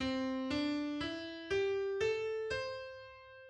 Gamme pentatonique do majeur

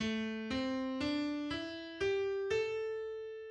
Gamme pentatonique la mineur

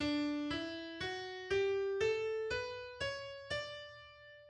Gamme ré majeur

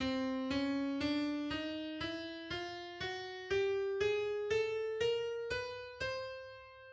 Gamme chromatique

